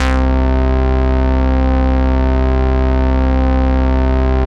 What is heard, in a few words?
Bass Note C